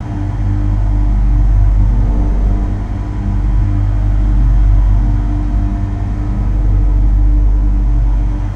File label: Machinery, ambience spaceship; Starbase Showcase